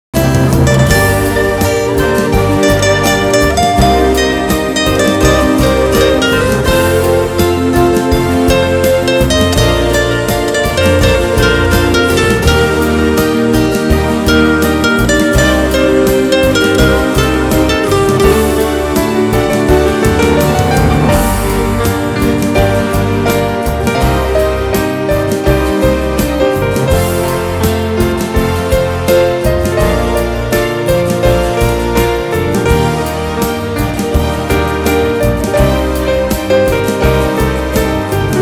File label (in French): Jaimerais guitare